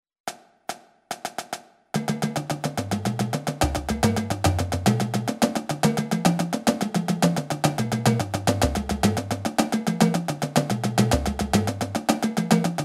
Grid 144, drumline exercise; Triplet Grid- (144)straight, flams; midi

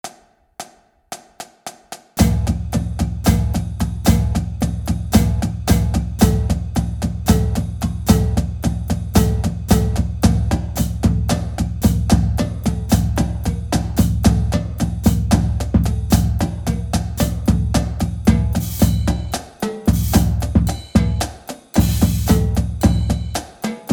Accent Tap 114, drumline exercise

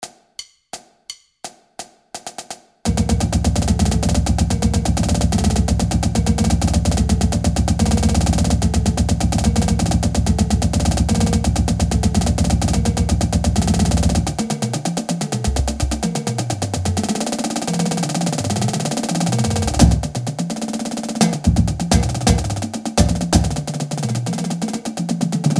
Diddle Dan 170, drumline exercise

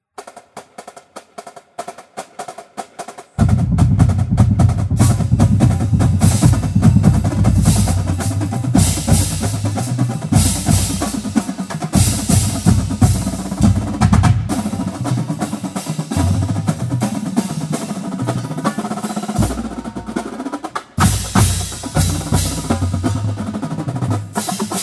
Drumline; MSU Drumline; Ditty

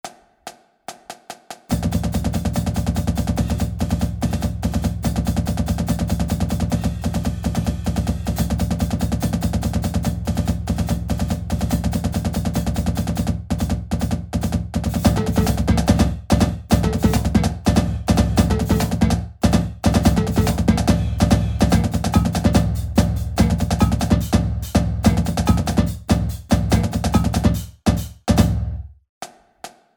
Sixteenth Timing 144, drumline

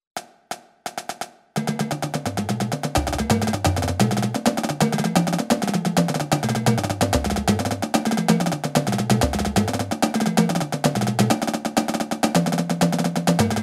Grid tapdrags 172 (midi), drumline; Triplet Grid- (172); straight, flams / diddles / tapdrags

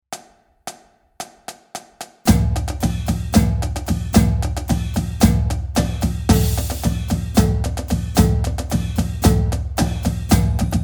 Accent Tap 114, drumline exercise

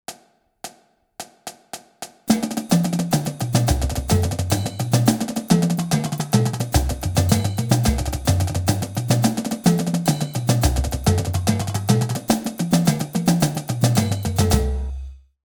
Chuggeda Flamdrags 108, drumline

